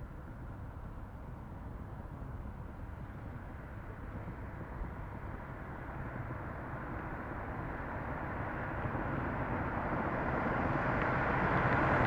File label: Generic Car, Pass By, Fast, Desert, Ext